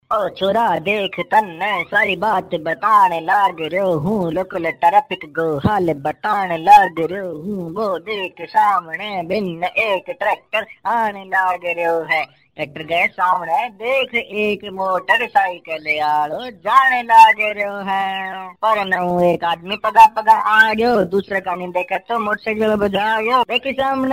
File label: Ringtone Local Remix